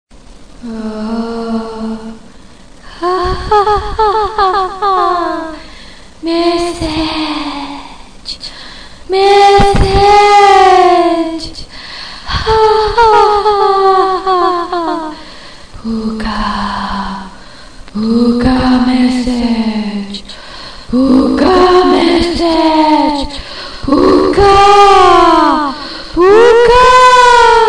ghost sms ringtone

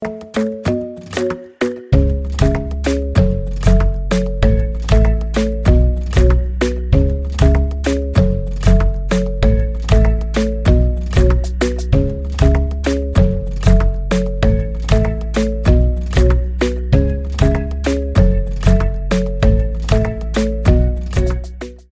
english instrumental ringtone

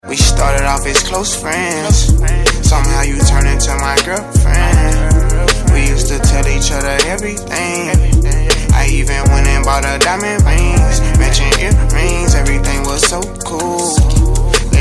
english song ringtone

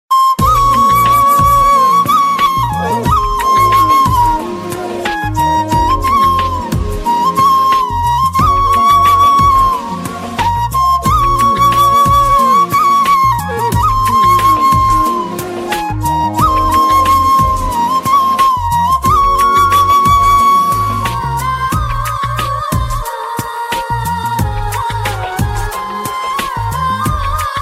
flute ringtone

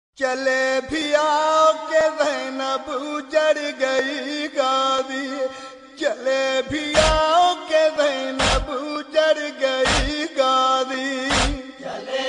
noha ringtone

